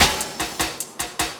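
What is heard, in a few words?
loop snare drum, 150 BPM, drum & bass; Ueberschall; Drum & Bass Resonance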